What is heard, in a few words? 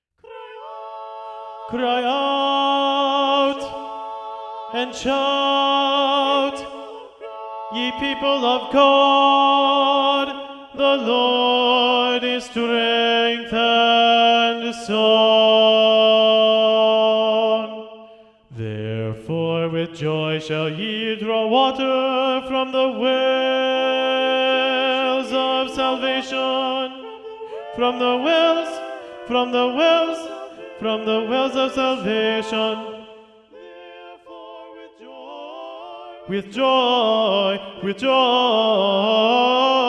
cry out and shout, tenor 2 predominant; knut nystedt